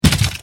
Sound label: click, spider; valkyrie npc pack; fallenlogic